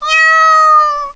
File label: Meow; Riviera